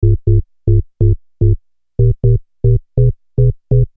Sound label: simple bass